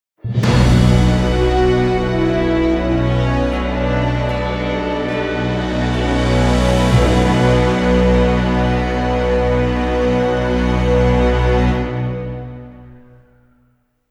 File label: Defeat Jingle; fire emblem musou fuuka setsugetsu original soundtrack 2023